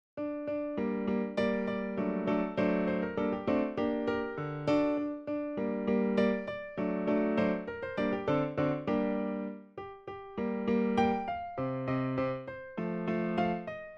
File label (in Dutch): harmonisch